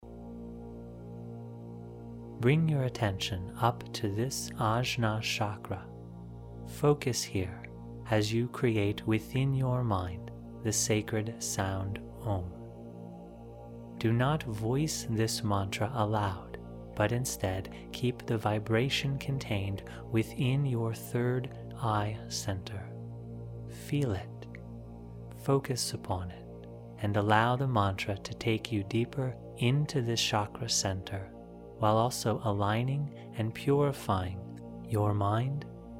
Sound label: Third Eye OM Mantra Meditation